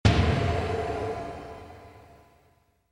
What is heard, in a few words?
Clock Timer; Timer Active After Phone A Friend; Millionaire Soundtrack; US Clock (2008); WWTBAM Clock Format